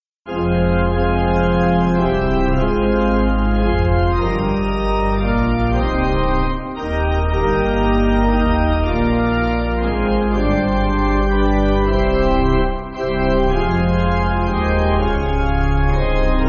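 Pipe, Pipe Organ, Music Backing Files for Churches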